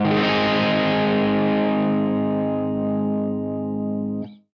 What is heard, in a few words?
guitar chord; Summer Catchers